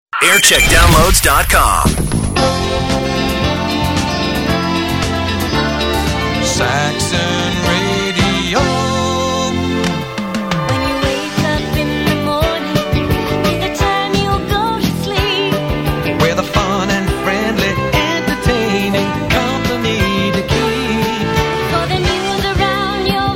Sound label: Jingle Montage; Saxon Radio; 1982